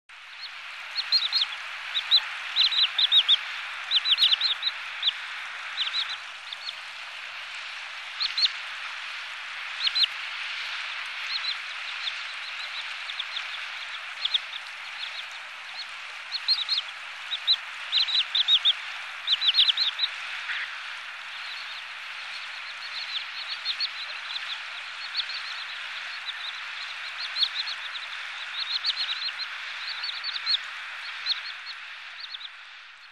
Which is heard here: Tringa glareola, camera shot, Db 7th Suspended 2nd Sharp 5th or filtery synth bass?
Tringa glareola